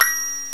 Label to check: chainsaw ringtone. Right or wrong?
Wrong